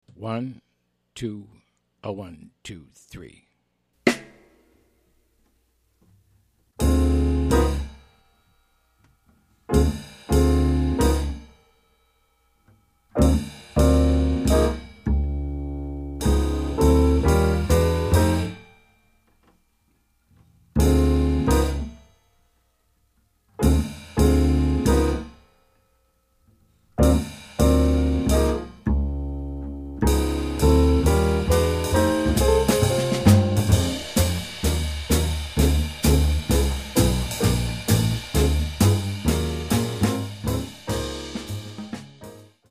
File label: minus Melody